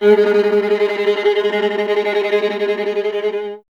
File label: viola, solo instruments